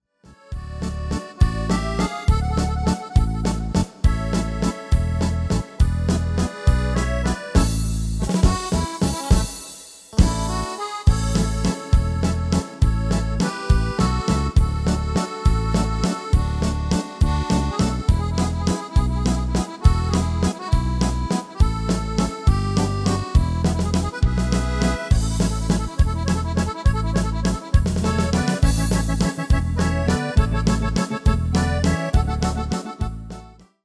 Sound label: MIDI